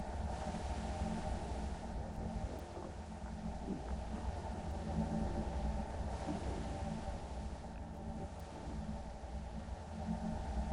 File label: Night Time Silence Bass; Lethal Company